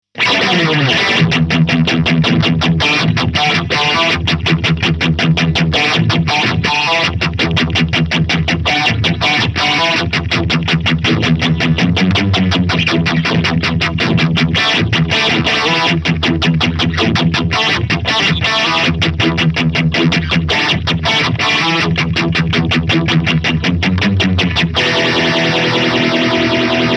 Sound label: Eventide Riptide Overdrive plus Vibe